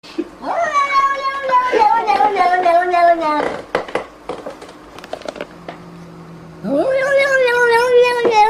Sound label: nonononono cat